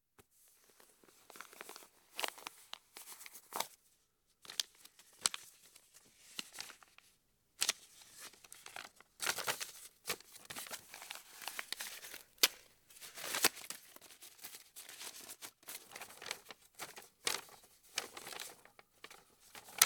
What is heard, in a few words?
Paper Movement Sweetener; Home & Office; 1001 Sound Effects